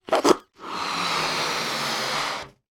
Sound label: human person blowing up small balloon